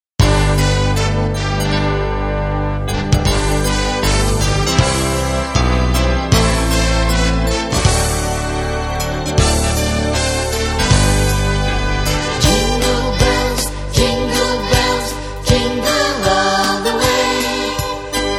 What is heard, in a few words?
jingle bells